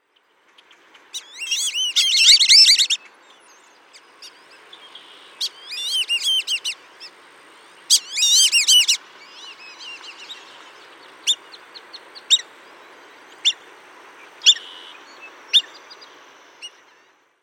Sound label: Black bellied Whistling duck